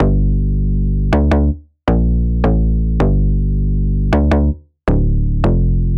Electronic; Bass Dragon Expansion Pack

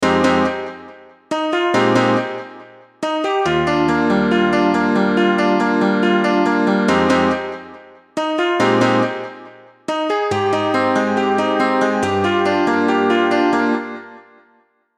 Keys; Night; Unison Ice'd Out Collection for Omnisphere

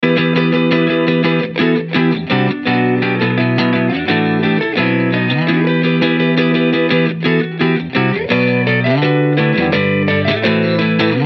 Guitar Loop 170 BPM Em; Unison Beatmaker Guitar Pack

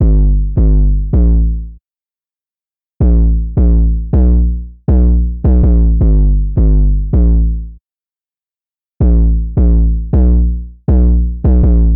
808 160 BPM Gm; Unison 808 Pack

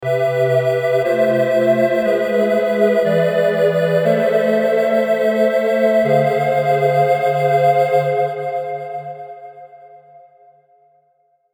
Major Sixth Diminished Prog; Unison Major Sixth Advanced Scales MIDI Collection

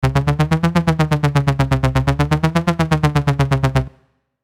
Lead, One-Shot; MIDI; Unison One-Shot MIDI Essentials

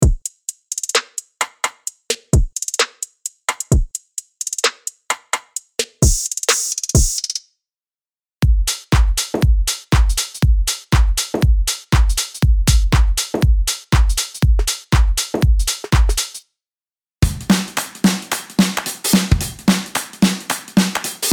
Drum Kit; Unison MIDI Drum Kit Essentials

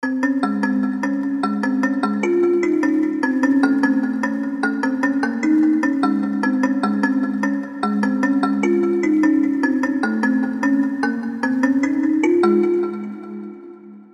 150bpm, Major Sixth; MIDI; Unison Major Sixth Advanced Scales MIDI Collection

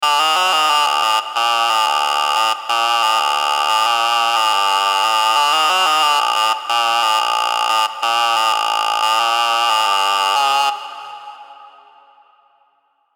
180bpm, Double Harmonic; MIDI; Unison Double Harmonic Advanced Scales MIDI Collection

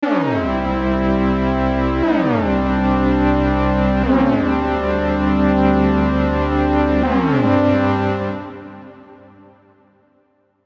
Major Pentatonic Prog; Unison Major Pentatonic Advanced Scales MIDI Collection

